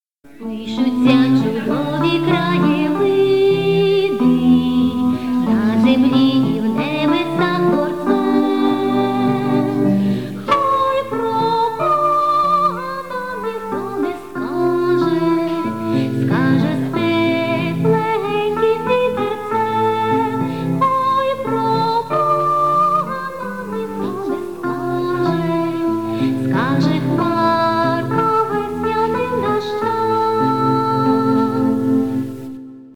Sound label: female solo